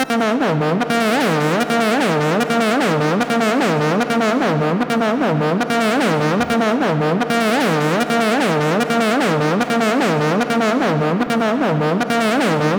Mama Dub; 100 acid vol 3

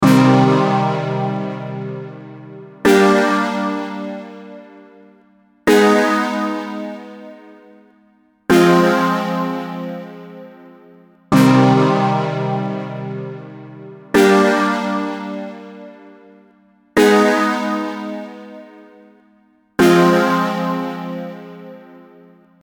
analogue stab; Cheeky-Geyser; Analogue Stab Generator; Rave Generator 3

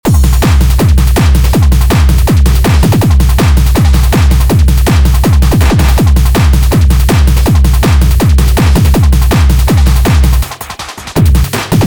Hard Techno; drum; beat; Drum Blaster: The Ultimate Beat Generator, Sampler Plugin for Modern Producers

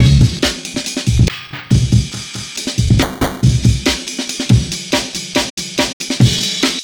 Amen Breaker 140Bpm; vst fx bundle

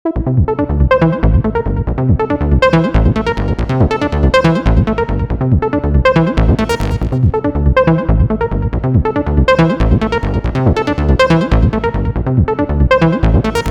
Beach Acid, trance presets; abx3 trance presets vol3

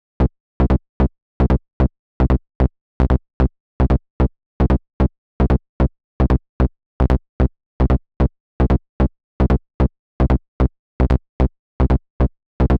Jump Dance Bass, acid techno trance; acid techno trance vol2

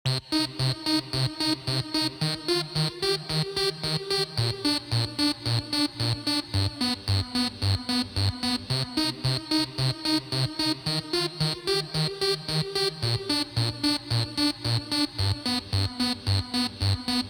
Auro Wave Lead, retro 80s synth wave; retro 80s synth wave presets abx3 vol1

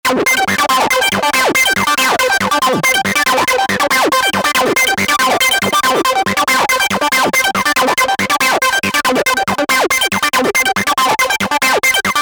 Fuzz Reactor, trance presets; abx3 trance presets vol1

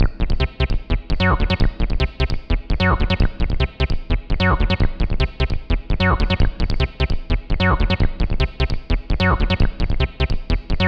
Start Up, acid trance techno; acid trance techno vol1

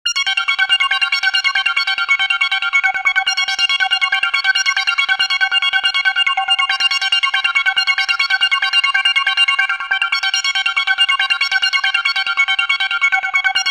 Hyper Arp; abx3 trance presets vol3

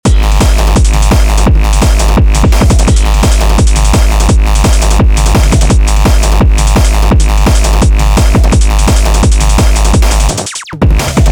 HardCore; drum; beat; Drum Blaster: The Ultimate Beat Generator, Sampler Plugin for Modern Producers

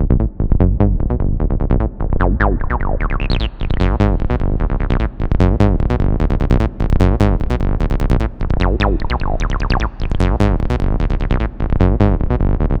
Bass, acid; HashBass; 100 acid vol 3

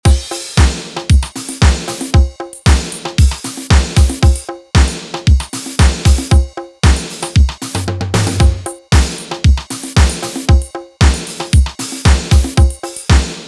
Disco; Drum Blaster: The Ultimate Beat Generator, Sampler Plugin for Modern Producers

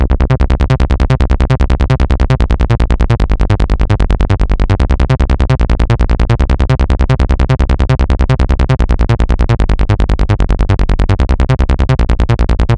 Battle Bass; abx3 trance presets vol2

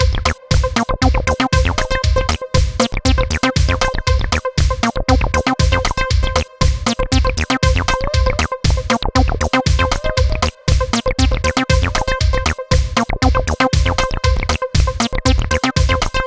Chicago Rage, acid house

